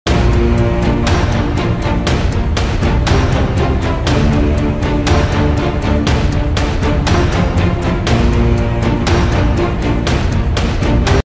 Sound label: Music of war, موسیقی بی کلام جنگ و مبارزه